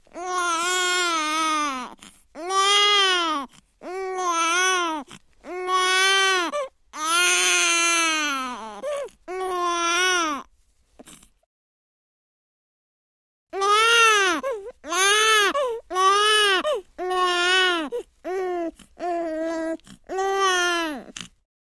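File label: newborn crying sounds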